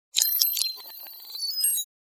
Futuristic data

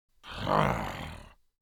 Orc creature short growl

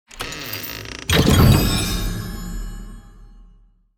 Fantasy rpg game menu ui unlock item

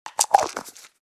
Plastic pill bottle twist cap closing

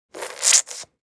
Nearly empty sauce bottle squeeze

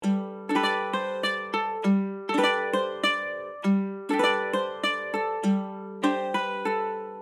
Medieval bard mandolin cheerful melody loop

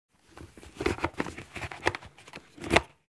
Motorcycle helmet putting on

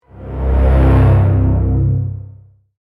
Dark cinematic suspense riser horror

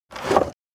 Skateboard picking up from concrete

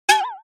Cartoon accent funny impact

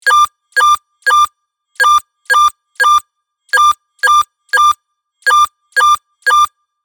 Science fiction cockpit alarm loopable

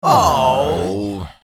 Crowd disappointed reaction

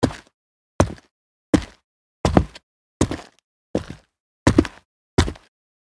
Footsteps on street boots walk

